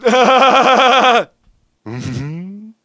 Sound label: hahahahah hm; ernie